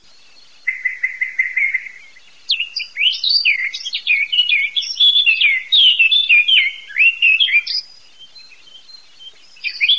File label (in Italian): CAPINERA